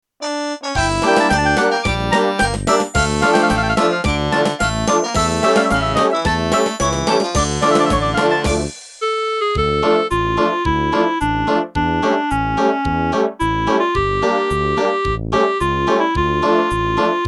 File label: karaoke